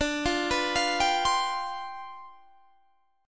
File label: C Major 7th Suspended 2nd Suspended 4th D, chord